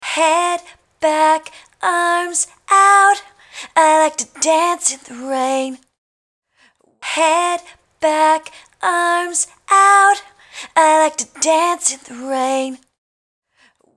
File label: garage band without effect